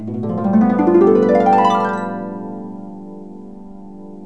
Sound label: HARP GLISS